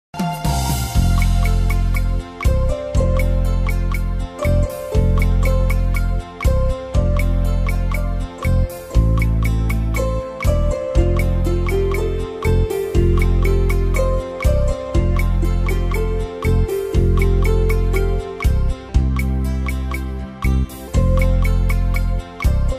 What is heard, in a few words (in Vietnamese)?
nhac khong loi, beat